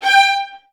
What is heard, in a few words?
VI G4, Advanced Orchestra; Best Service